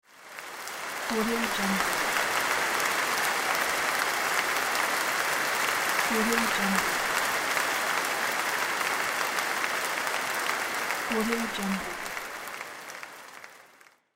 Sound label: applause